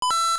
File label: coin; GBA; MLSS